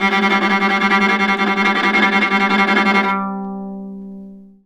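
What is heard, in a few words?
VIOLA tremolo G2; Orchestral; DirectWave; Varazdin Orchestral; Viola II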